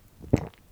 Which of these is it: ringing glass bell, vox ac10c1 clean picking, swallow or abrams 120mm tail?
swallow